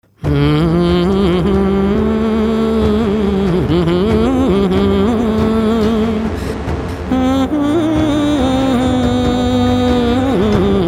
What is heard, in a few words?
cinematic; cambodia